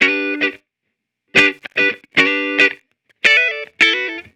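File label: Hip Hop Guitar, Emaj, 110 bpm; Digidesign; Pro Tools; Pro Tools Essential Loops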